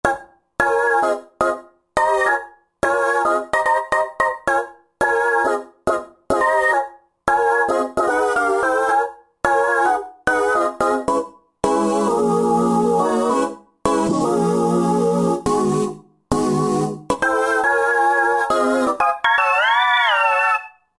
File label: Roland JV-2080; Raverborg